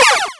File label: Alien Blaster shot sound effect; for video games; Alien Blaster shot sound effect for video games or something else